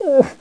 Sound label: CRY; dogz